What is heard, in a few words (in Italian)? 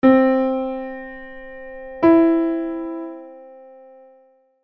Terza maggiore